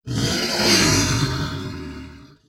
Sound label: beast woo leader move